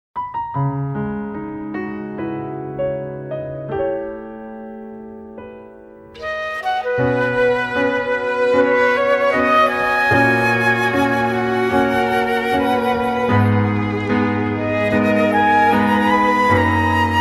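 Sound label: Piano Instrumental - Korean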